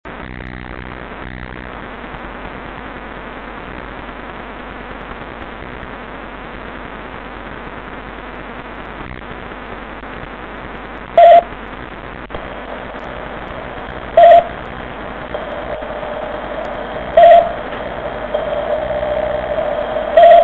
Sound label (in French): micro et HP; camera IP; Foscam FI8918W